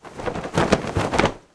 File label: die